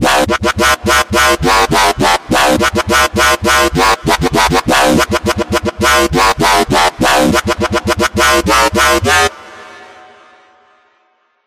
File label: FM BASS